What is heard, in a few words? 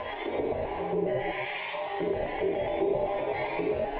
Tonal Re Draw, sparse soundscape